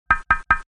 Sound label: 3 x Knock